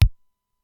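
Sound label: Simmons SDS-9 bass drum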